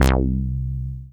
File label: Casio MT 600 Synth Bass C2